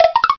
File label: DINKLE